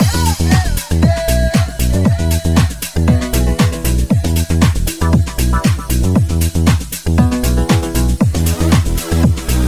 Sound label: Samsung Mobile Ringtone